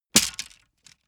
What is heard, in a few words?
wood sticks small break